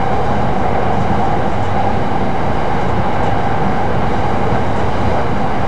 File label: Run, train; OpenBVE